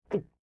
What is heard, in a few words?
gulp